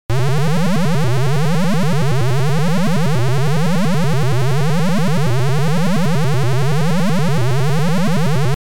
UFO Sound; Oretachi Game Center Zoku; Scramble

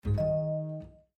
Pop-up Blocked; Cityscape

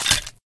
Bone Crack; doodle jump 2; android

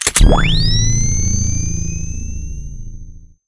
Powerup Laser Sight

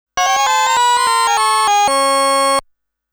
Game Over MUSIC; Namco Classic Collection Vol.2; arcade

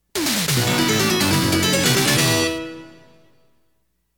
Clear (Stage Clear); Konami Game Music Collection

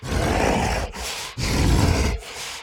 Walk Cycle Burns; Devour 2021 gamerip pc macos

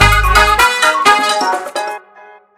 Win

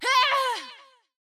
Hit; Mother Nature

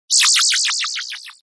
Award award Snd

